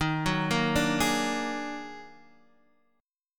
D sharp Minor Major 11th D sharp, down, Guitar, Standard; D#mM11 arpeggiated